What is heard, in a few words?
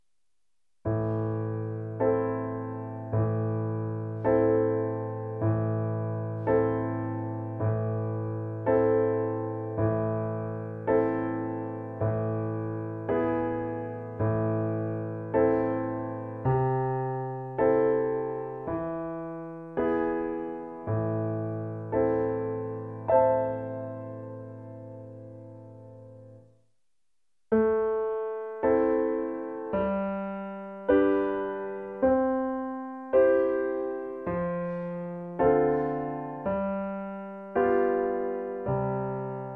PIANO; Solveig Grieg